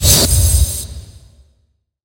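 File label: power up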